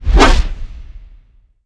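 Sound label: Torturer Attack; Seer; GamigoNA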